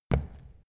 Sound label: step wood indoors